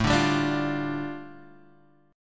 A 7th Suspended 4th Sharp 5th A Guitar Open G, chord